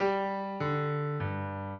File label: trio; wayne musical dice game